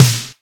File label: dirty snare sound a sharp key, snare drum tuned to the A# note. Loudest frequency: 2034Hz